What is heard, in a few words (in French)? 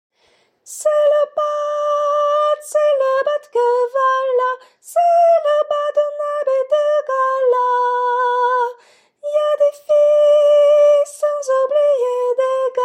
tenor; C'est L'Aubade que voila